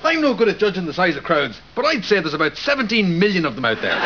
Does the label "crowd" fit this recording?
Yes